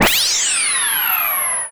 droplet; Zaps and Beeps

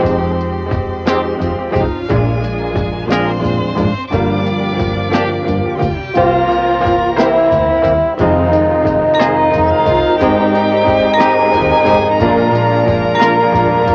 strings six eight strutter, loops, phonky

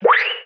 whistle up

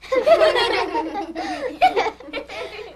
laughter children; ooh ahh

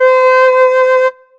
flute; Winds

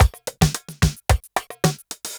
arab percussion, Drum loops Funky

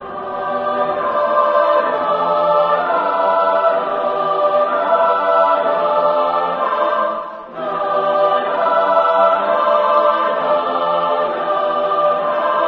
voice choir of nahs, Loops, Sad

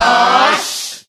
ush; ooh ahh

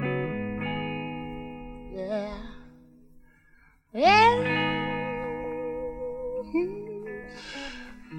guitar love happy; Loops; Phonky

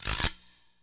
guiro

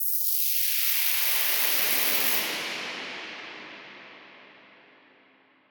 coil, Percussion Swish

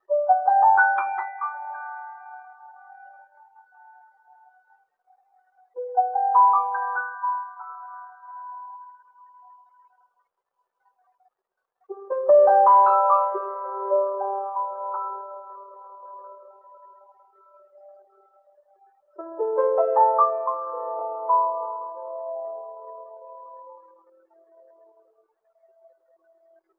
piano, Loops, Sad

